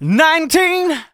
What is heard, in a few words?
vocals sung F#; Techno Trance Essentials